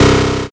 zap

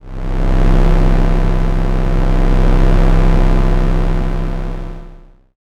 space engine

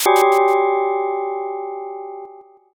sting

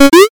picoyes

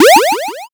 jump

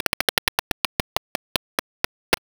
roulette

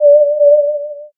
owl

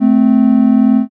horn

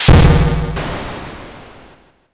thunder magic

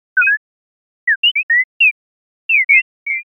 chirp mix

